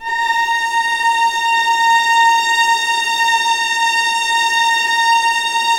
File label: A#3, Ultimate String Collection, VLAS EXP; LEG